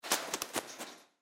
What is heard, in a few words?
Bird Raven Caged, Bird Raven Caged Flap Wings; Animal; Bird Sound Effects